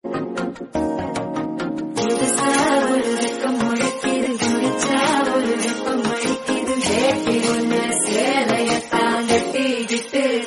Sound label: Romantic Tamil Ringtone